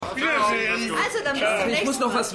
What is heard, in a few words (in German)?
Partymenge; Stimmproben